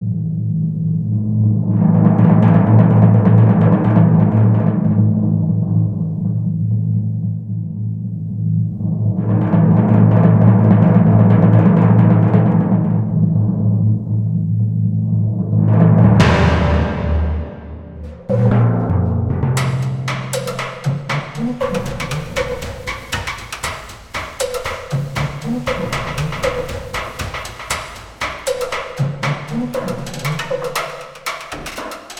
timpura

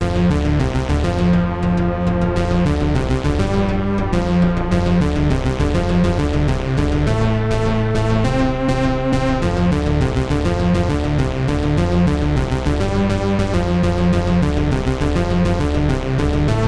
big in pwm box tv

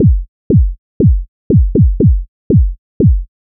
basic kickdrum 120 bpm

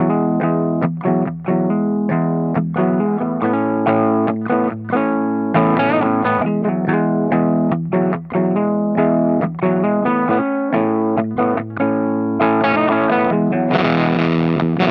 fuzzed guitar

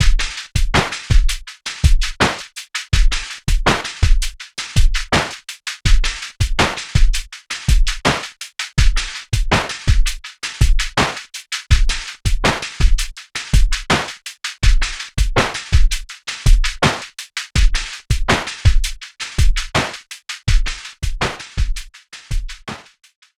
elec drums organizer

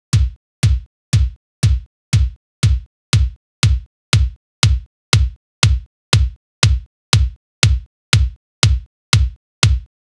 transep kick min max

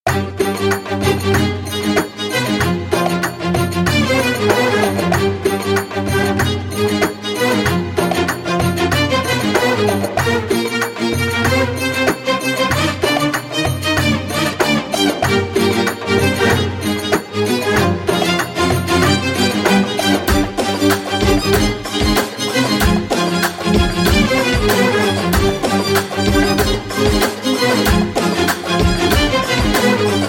spiccato 95 bpm

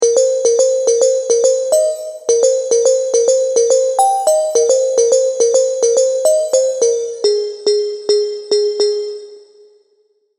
serum plucks; chiqui audio

